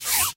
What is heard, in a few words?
reload sfx; 芬克斯; 神话